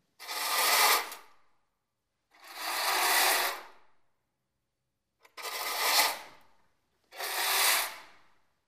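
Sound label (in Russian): шторы лёгкое скольжение маленькая комната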